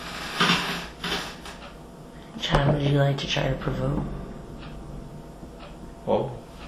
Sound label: Unexplained Growl; Upstairs Kitchen; Ohio Researchers of Banded Spirits; lighthouse; fairport; EVP